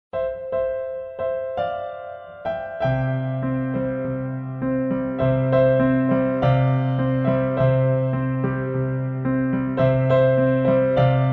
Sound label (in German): Entspannungsmusik für Babys